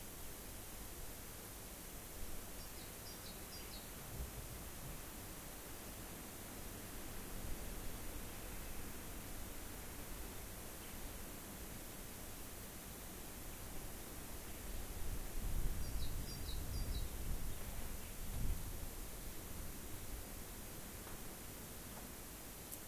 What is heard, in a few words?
rayol farm; SEAMED